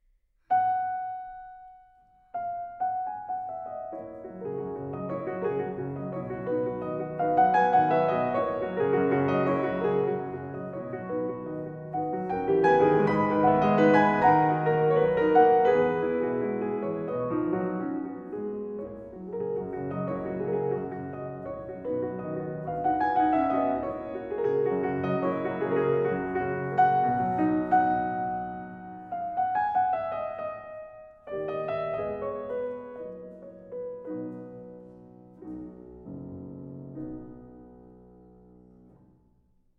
Preludes No 11 in B major Vivace; Chopin; Twenty-four Preludes, Op 28

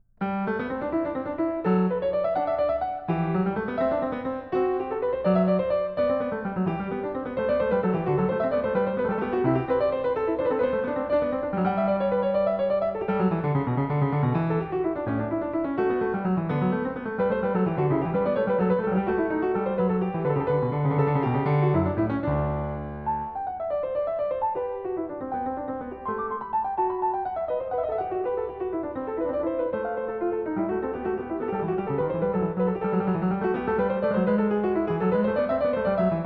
Clav., Binaural; Goldberg Variations, BWV 988 Variation 27 a 2; Canone alla Nona; Bach

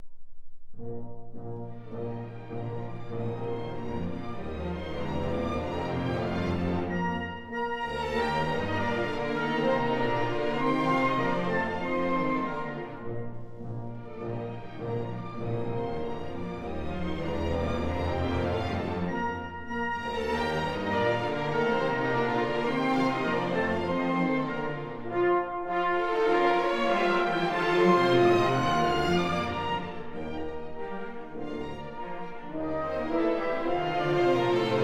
Variation 1 Poco piu animato Andante; Ivan Fischer; Brahms; Haydn Variations

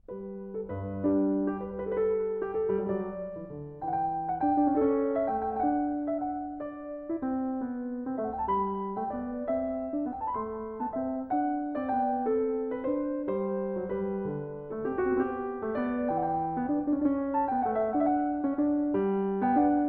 2 Clav.; Goldberg Variations; Bach; Zenph Re-performance; BWV 988

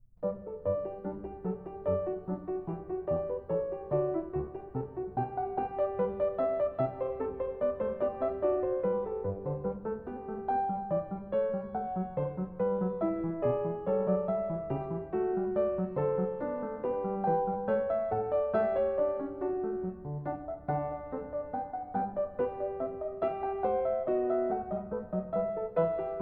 Clav. (Binaural); Bach

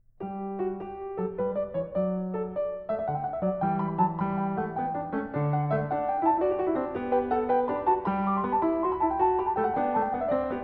Clav. Canone all' Ottava (Binaural); Goldberg Variations, BWV 988 Variation 24 a 1; Bach

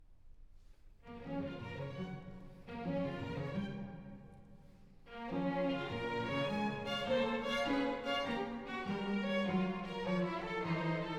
Variation, Chamber Orchestra; Stravinsky; Apollon Musagete; Variation de Calliope; Chamber Orchestra of Europe

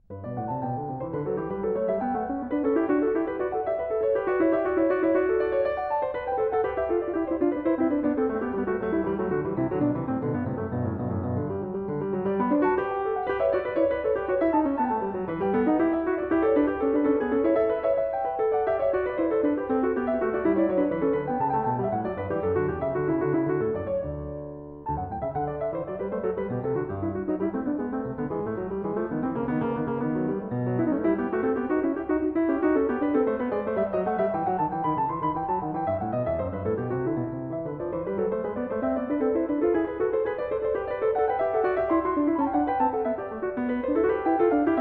2 Clav.; Goldberg Variations; Bach; Zenph Re-performance; BWV 988

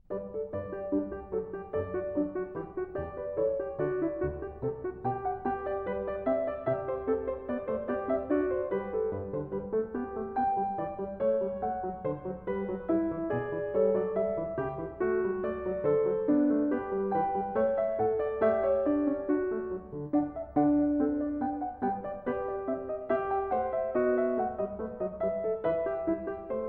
Goldberg Variations, Variation 19 a 1 Clav.; Bach; Zenph Re-performance; BWV 988

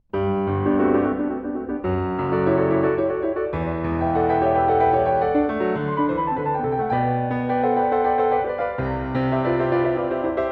Variation 29 a 1 ovvero 2 Clav.; Goldberg Variations; Bach; Zenph Re-performance; BWV 988

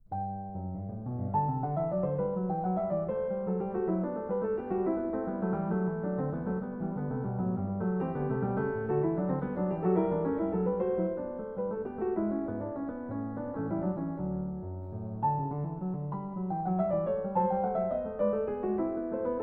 Clav., Binaural; Goldberg Variations, BWV 988 Variation 6 a 1; Canone alla Seconda; Bach

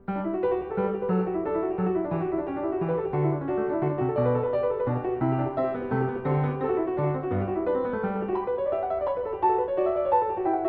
Clav. (Binaural); Goldberg Variations, BWV 988 Variation 5 a 1 ovvero 2; Bach

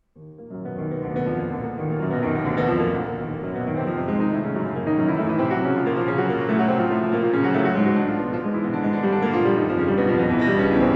Preludes, in E flat minor Allegro; Chopin; Twenty-four Preludes, Op 28

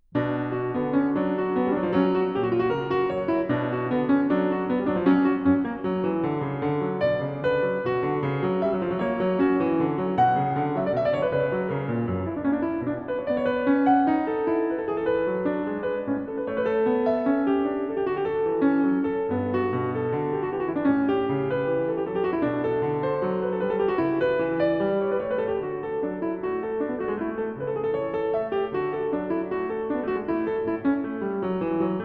Praeludium h-Moll; BWV 893; Bach; Das Wohltemperierte Clavier; Andras Schiff; ECM

